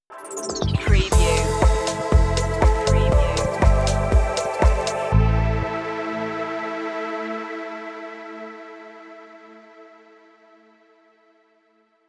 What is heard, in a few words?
light spin stinger